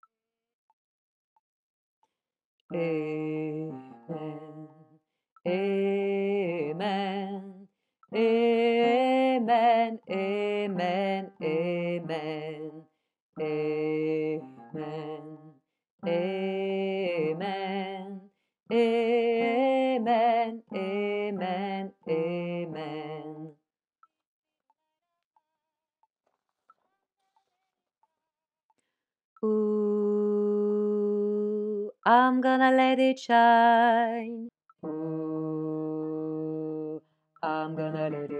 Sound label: Amen Let it shine - Basse